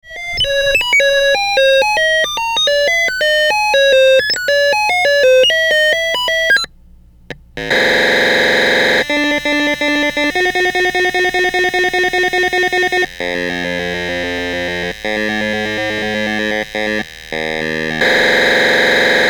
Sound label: fusebox